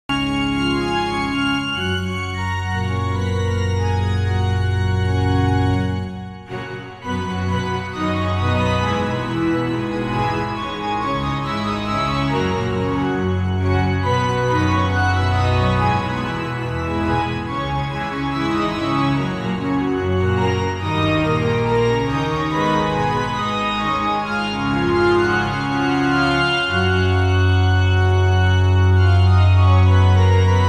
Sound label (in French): Fugue, Orgue